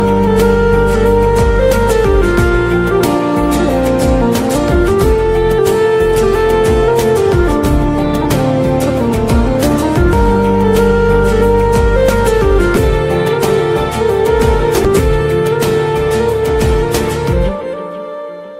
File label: Instrumental Ringtone; Baaghi 2